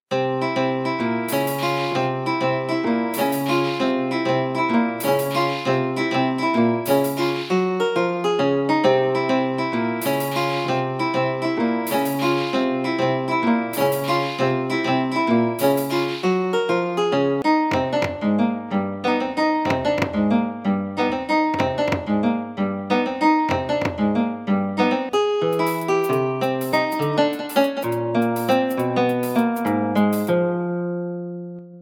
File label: arabic jazz